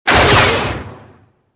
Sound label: droid explo; interrogator